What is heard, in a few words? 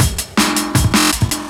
Dly Break Beat, Drum Loops